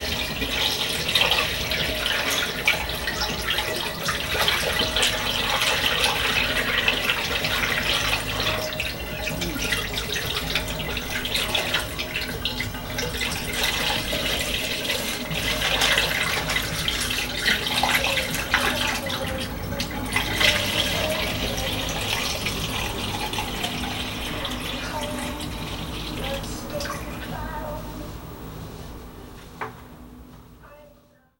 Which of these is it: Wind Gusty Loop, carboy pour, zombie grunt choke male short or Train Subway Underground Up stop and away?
carboy pour